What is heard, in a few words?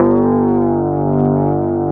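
Wurlitzer G1, Keyboards Old School; USB Soundscan vol.09; AKAI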